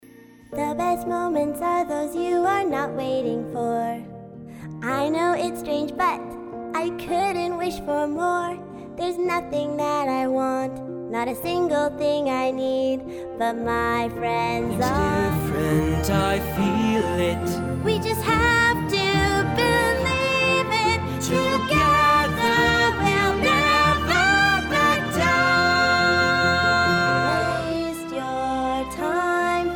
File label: singing